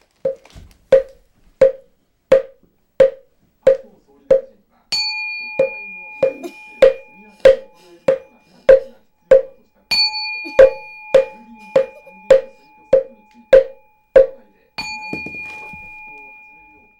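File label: Buddhist altar; 仏壇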